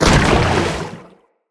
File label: Dendrit Staff Caster Proj Impact Damage; Weapons; Staves